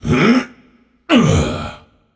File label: nv grunt lift; cernunnos